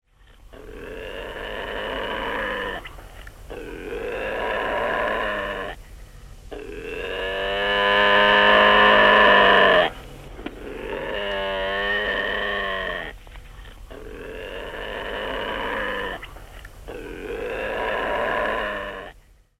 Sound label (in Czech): hlas zvuky zvirat